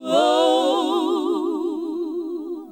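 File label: Gospel; Best Service Gigapack 1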